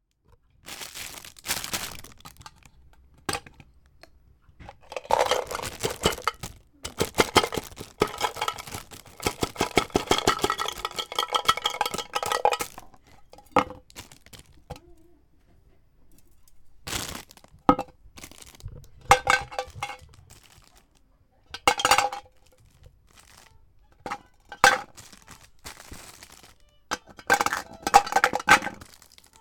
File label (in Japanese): doroppusu; Chino Cultural Complex